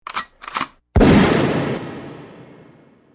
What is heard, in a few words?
GUN COCK SHOT